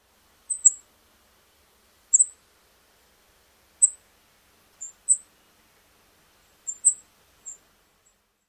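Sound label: Cape May Warbler calls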